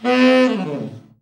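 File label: TENOR overblow FALL, Sax; Giga Samples Collection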